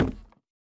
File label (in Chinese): 錾制书架踩踏声